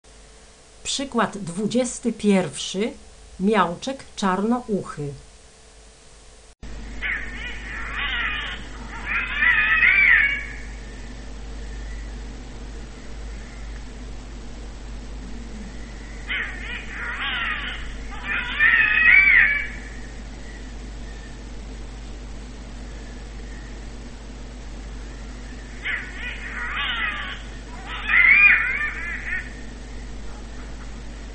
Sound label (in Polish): miałczek czarnouchy